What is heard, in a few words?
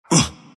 embr pain; dota; ember spirit